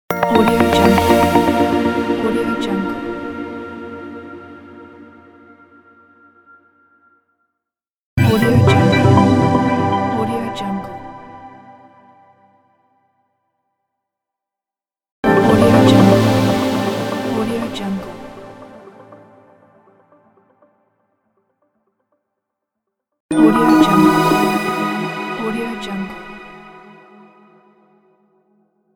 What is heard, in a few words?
hightech intro logo pack